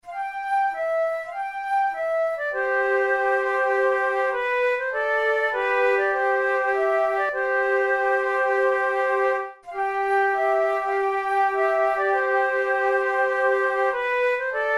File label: duet, flute